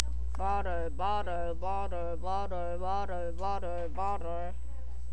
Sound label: bottle